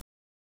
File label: silence